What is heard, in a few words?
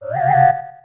warp; sm64